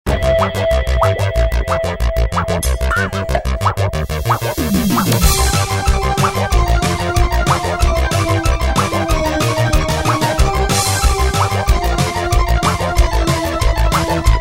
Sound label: glitch; GarfieldPC